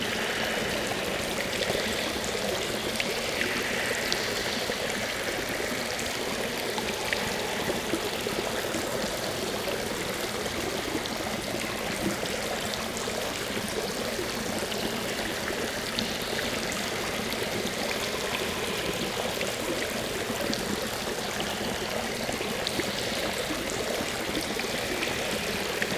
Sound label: Sewer Water Heavy; silent hill homecoming 2008 gamerip pc ps3 x360